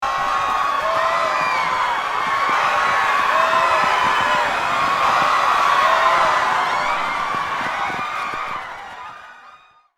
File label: Panic crowd screams disaster chaos; scream sound effects